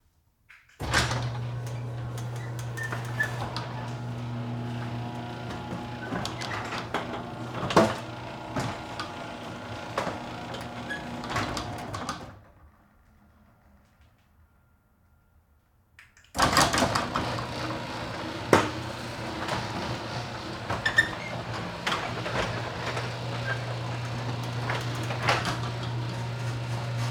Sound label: garage door following door interior; sonniss gdc